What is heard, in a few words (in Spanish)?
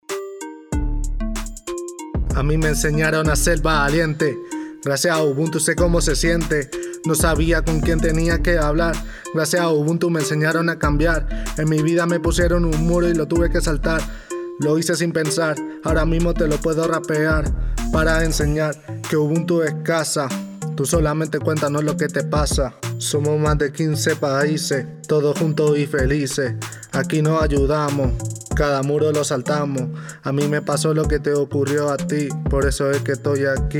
RAP